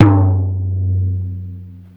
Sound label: tom tuning open